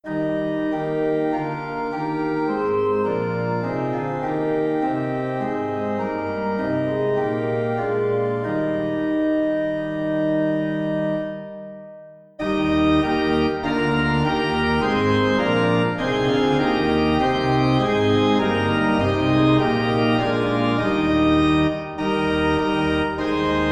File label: Organ